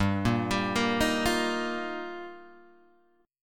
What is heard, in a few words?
G Minor 7th Sharp 5th, Guitar, Open G